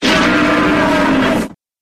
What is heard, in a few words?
monster cyclops